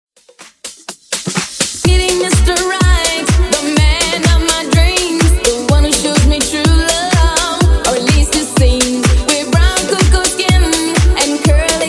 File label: Dizko Funk 125bpm